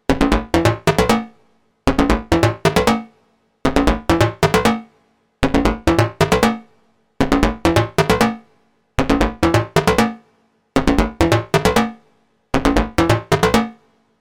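D minor plucked modular synth techno sequenced 135; loops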